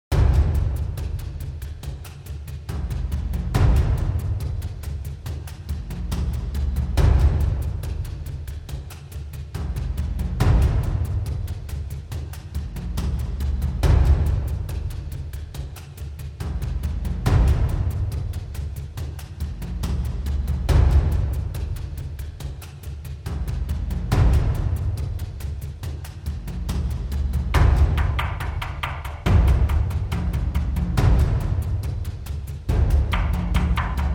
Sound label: drums